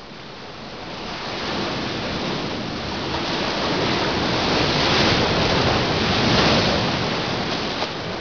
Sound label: wave